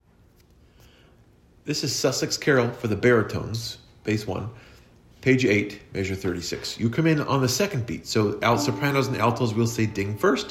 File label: baritone, adult choir; Sussex